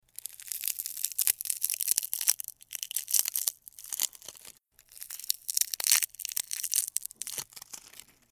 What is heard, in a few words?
nori